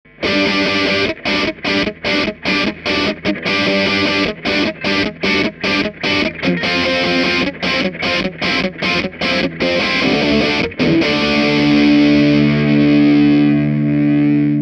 distortion; austrian audio od5

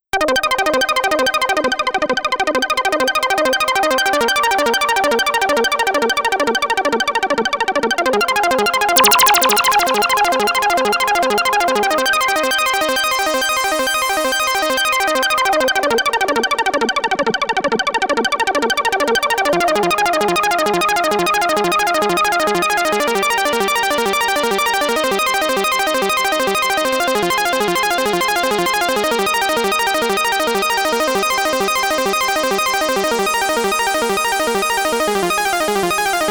SH-101 Arpeggio

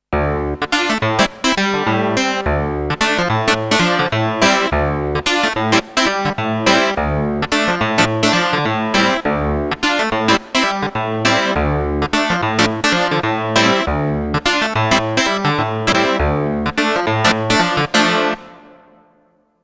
TX7 Hohner D6

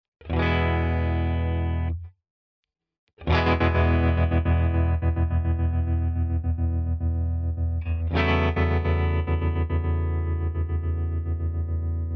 Walrus Fundamental TREMOLO Random hard

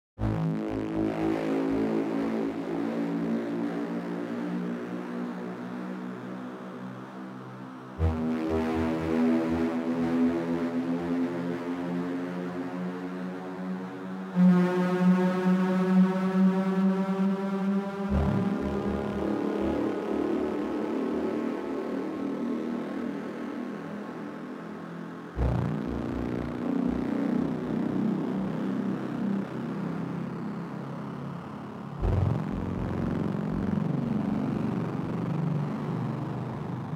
Deep Noise

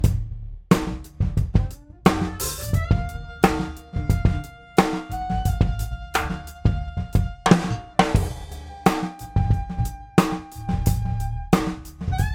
Drum Summe SSL E UAD2 B

